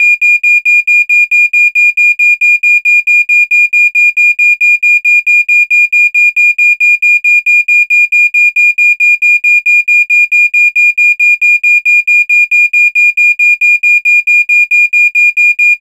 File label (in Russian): zvuki datchika dyma